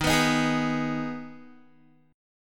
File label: Eb Suspended 4th Sharp 5th, Guitar; Ebsus4#5; strummed